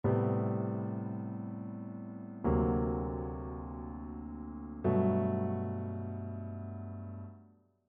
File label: ii V I progressions; ear training